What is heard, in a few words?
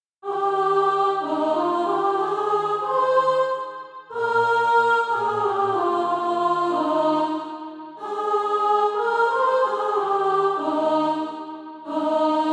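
alto, SATB Practise Music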